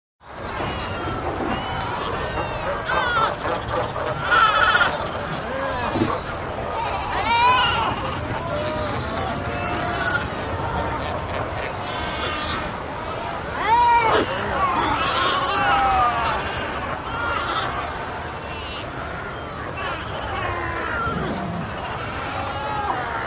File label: Seal Cape Fur Barks, seals & sea lions